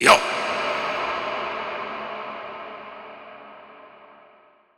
Viking Vocal Hits Am 87Bpm; Construction Kits; VOCALS; Ghosthack; SkyRealm; Kit 18 - Shivering Sea

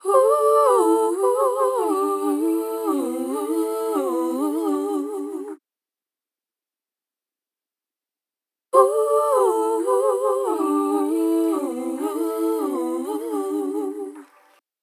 Ad Lib Soul - 110 BPM C Maj Dry; Vocals; Cymatics; ZODIAC Beta Pack

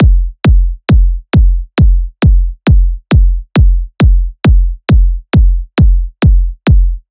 Kick Loop 135, Techno Drums

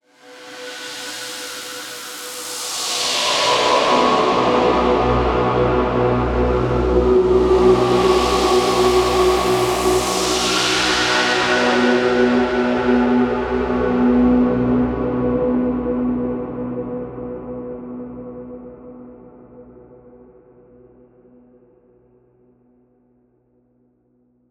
Atmosphere B 142 BPM, Psytrance; Walls

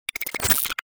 Glitch

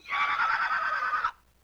LoFi Synthetic Squawk

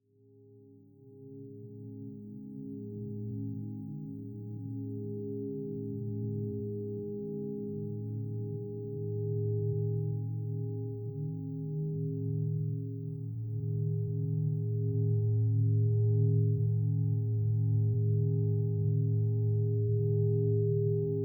Ambience

